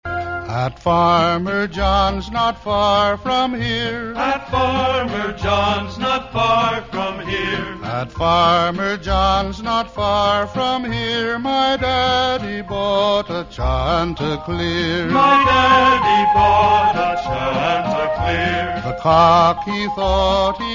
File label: Tenor Doodle-Doo